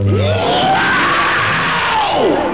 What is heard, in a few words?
woooow